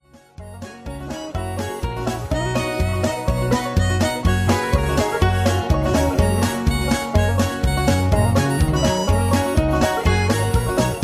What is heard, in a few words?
Gospel Medley High Key